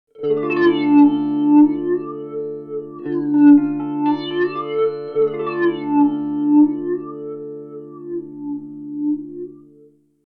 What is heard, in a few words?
TC Electronic 2290 P Flanger